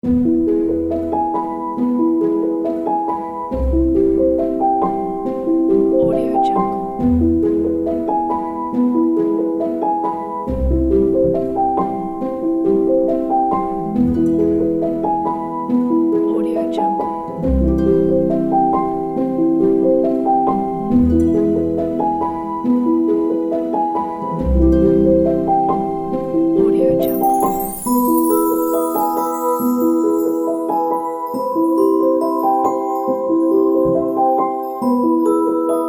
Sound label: warm magical fairy miraculous piano; animapiano